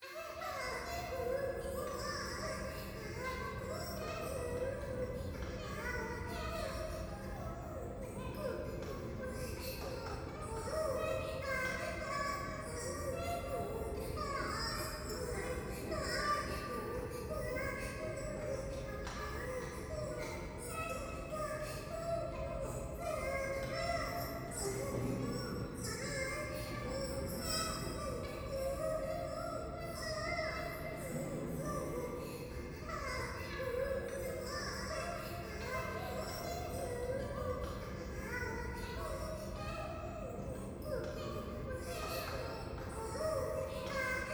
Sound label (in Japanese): 風呂 可愛い喘ぎ 電車, ASMR